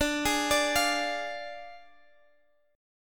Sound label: D Diminished Guitar Half Step Down, chord